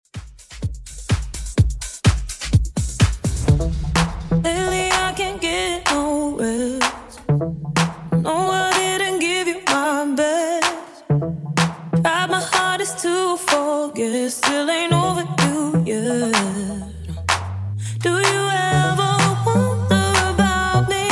Top40 Redrum 126bpm; remixplanet

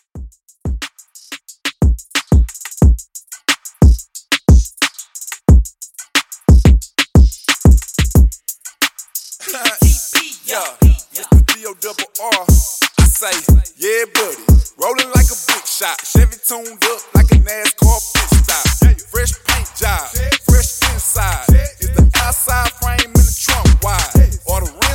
Old School 90Bpm; Redrum

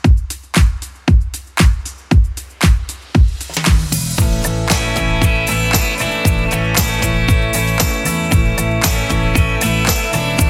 80s Club 116bpm CLEAN

